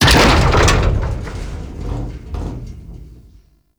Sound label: Ship Hull Impact Large; Railjack Hull Hit Effects